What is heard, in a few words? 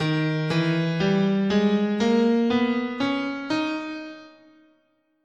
Eb Phrygian Dominant, scale